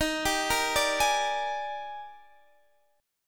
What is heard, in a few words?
D sharp Major 11th, down, Guitar, Half Step Down